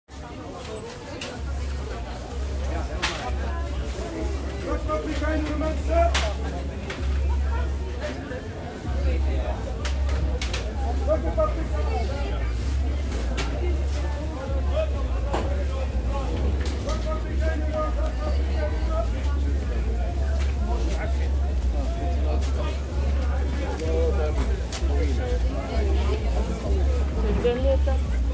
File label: People market + bass, Rotterdam; Rotterdam Field Recordings